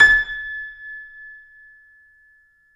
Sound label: C7 F, The Ultimate Piano Collection; East West